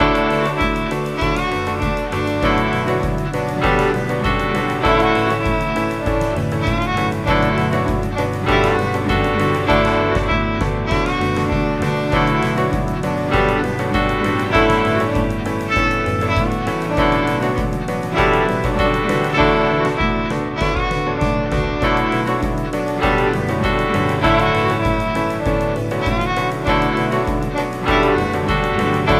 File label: Chill acoustic pop